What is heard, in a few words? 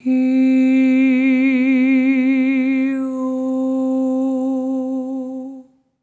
EH OH C4, SOP; Spectrasonics; Symphony of Voices